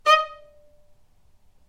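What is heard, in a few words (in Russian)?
звук ноты ре диез вторая октава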